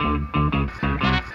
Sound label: guitar with small horns, Loops; Phonky